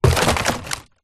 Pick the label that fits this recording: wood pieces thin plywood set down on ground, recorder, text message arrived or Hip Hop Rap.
wood pieces thin plywood set down on ground